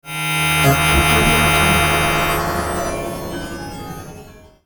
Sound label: loud synthesized scifi computer doing a scan